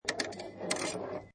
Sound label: Pinball Sound Ball Rolls On Rail; Video Games